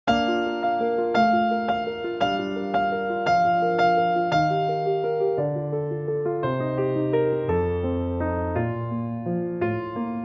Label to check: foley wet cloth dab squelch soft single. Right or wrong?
Wrong